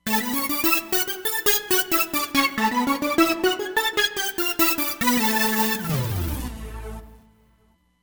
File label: Space Lead